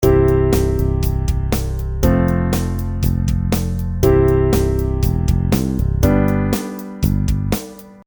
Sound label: Main Progression